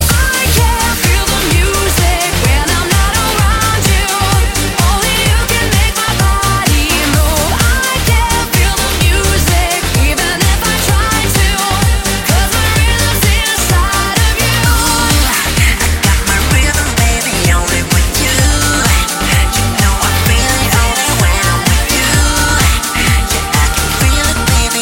My Rhythm

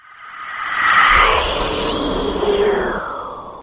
New Ghost Sms Tone

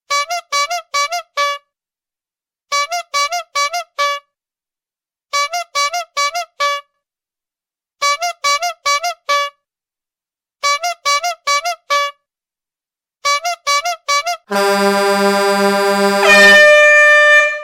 Awesome Alarm

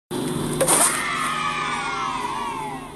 Grunt; Birthday Party

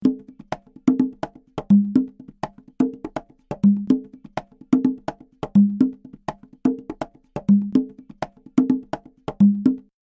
House Bongo Fever

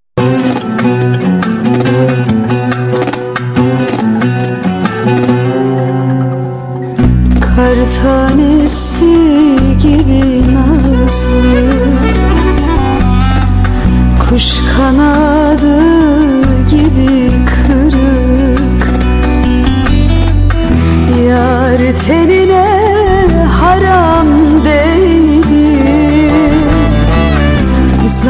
Turki Music

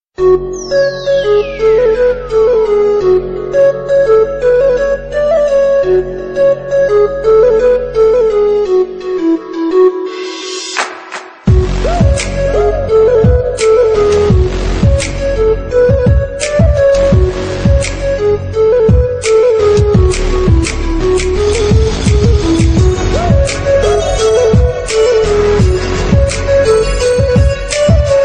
Hip Hop Arabic

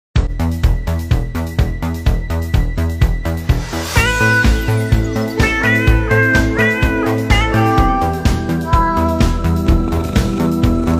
Song Cats Miau miau

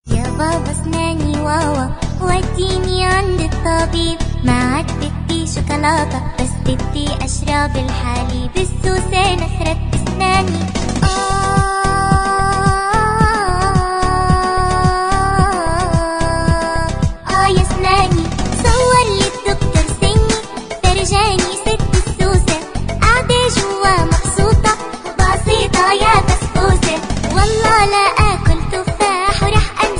Somali Song

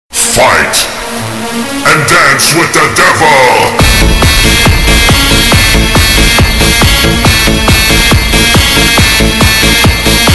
Devil Techno

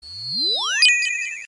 Ice barrage short

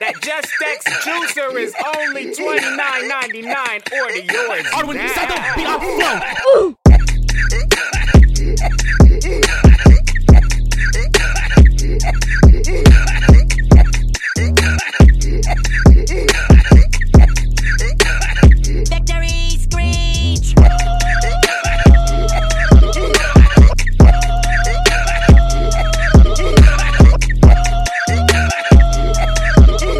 Coughing; CalebCity

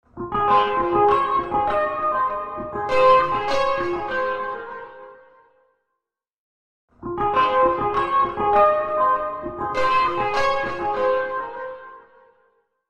Guitar Sms

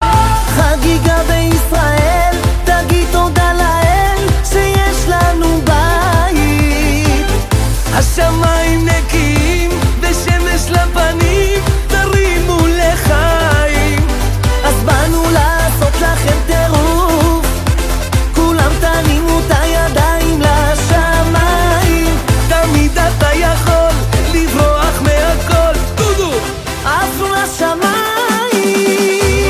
ISRAELI CELEBRATION

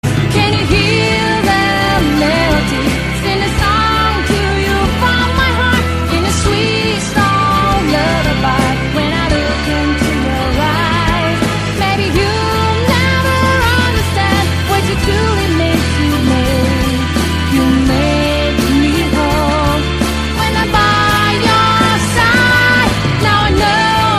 Lullaby

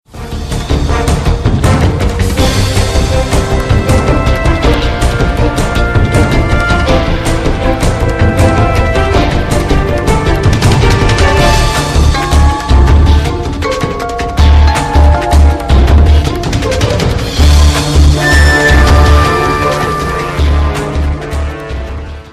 Alert Mode; Mgs 3

